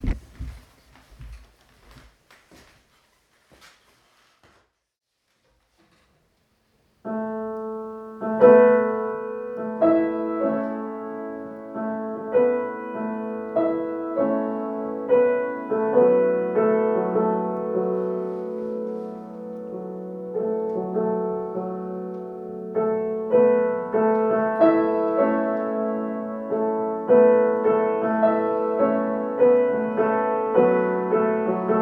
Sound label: piano